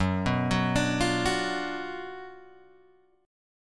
F sharp Major 7th Suspended 4th Sharp 5th, F sharp, down, Guitar, Open G